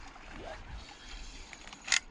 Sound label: Fly Rod Casting